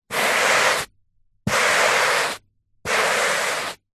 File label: household brush hand held sweep carpet long strokes; zapsplat